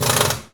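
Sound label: Impact small, air tools, industrial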